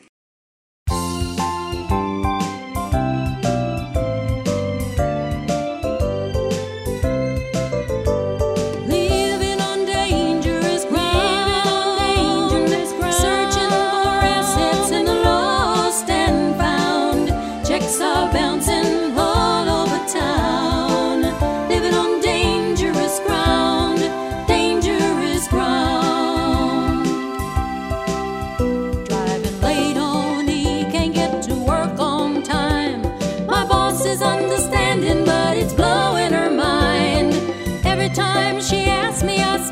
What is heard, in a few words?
Singing Duets; Radio Rainbow Artists Guild